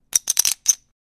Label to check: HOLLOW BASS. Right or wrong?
Wrong